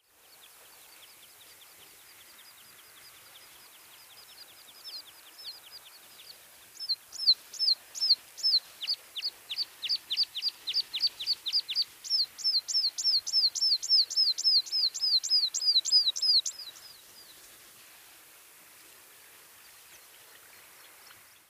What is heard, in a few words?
American Pipit